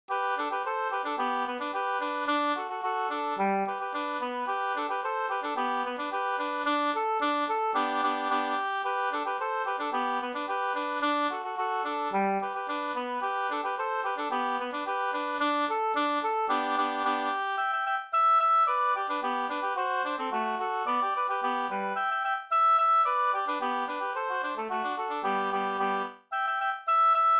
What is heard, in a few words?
polka